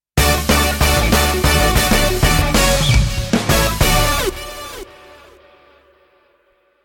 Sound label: Commercial Break